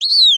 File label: Animal Bird Western Wood Pewee Chirp; animals; AdobeCC